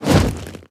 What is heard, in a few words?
sweep tail circle